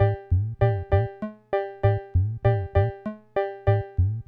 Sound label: Loop, ct-24; classic machines